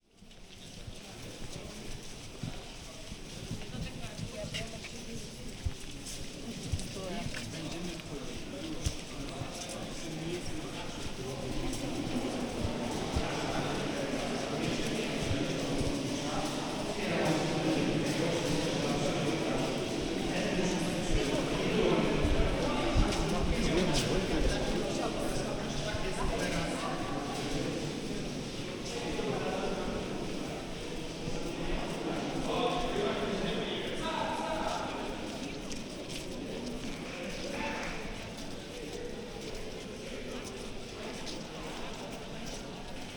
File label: binaural